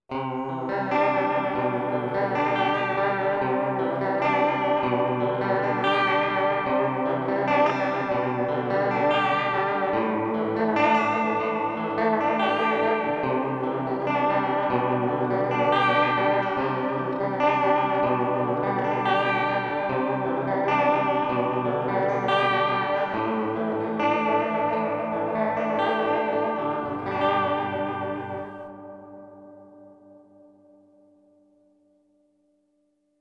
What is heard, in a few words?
clean chorus hall, guitar; Clean Chorus with Hall reverb; GD101X